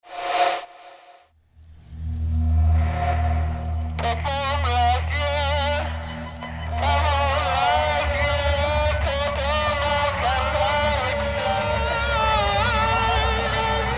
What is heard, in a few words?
Chant